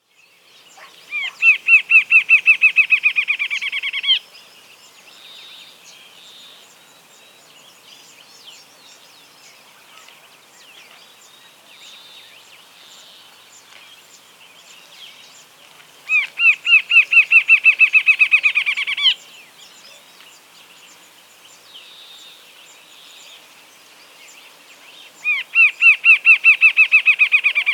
Rufous-Capped Antshrike (Thamnophilus Ruficapillus), Andean Birds; S. America